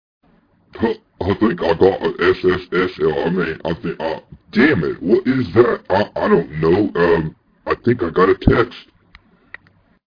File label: drunkn sms rev ringtone